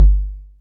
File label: kicks, Bass Drum